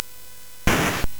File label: Dark Castle, CSG8580R5